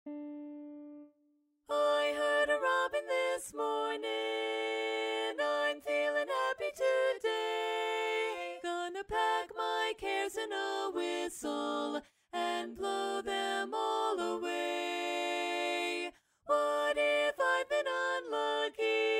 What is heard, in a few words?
Lead; region17online